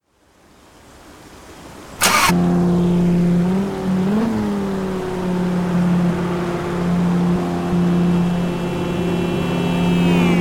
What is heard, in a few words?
audi r8 lms